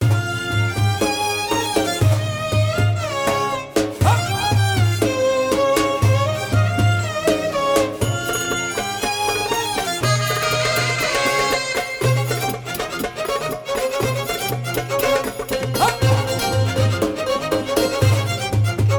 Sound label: Drums; Sonokinetic; Sultan Drums